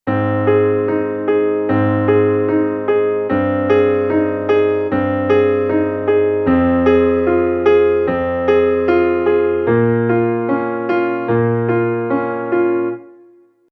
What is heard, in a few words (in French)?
balade arpégée binaire